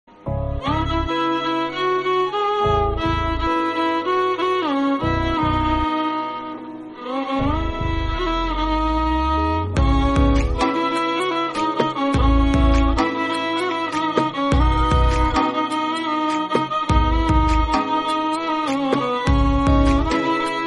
VIOLIN Instrumental

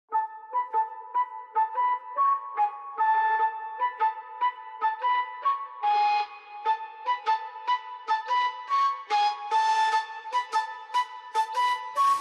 Instrumental Bgm

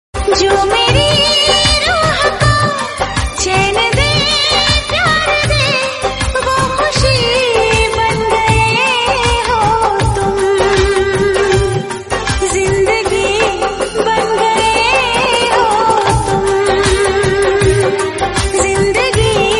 Female

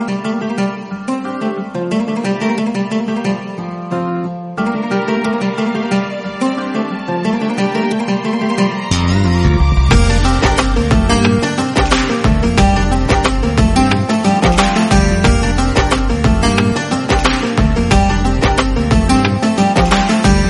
Instrumental

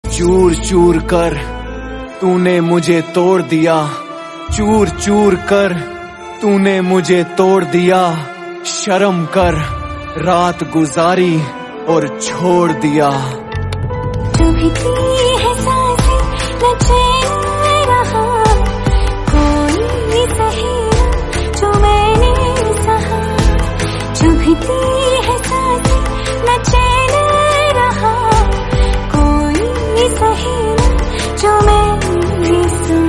Rap

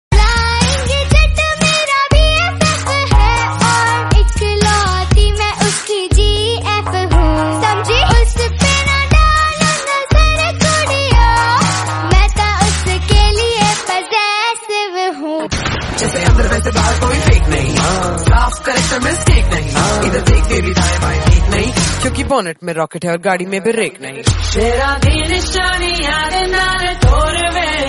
Hindi song

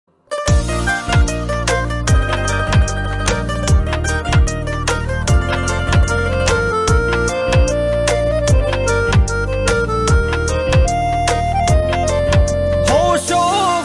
Instrumental

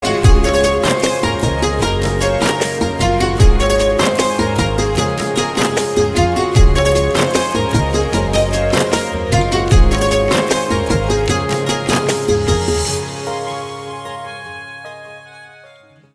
Instrumental

